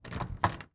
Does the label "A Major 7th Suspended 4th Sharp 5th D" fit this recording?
No